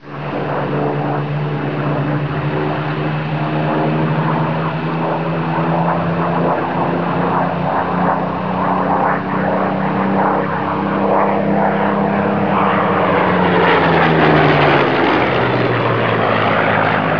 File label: Spit MKII